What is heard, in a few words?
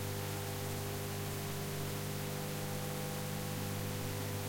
TV; MiSide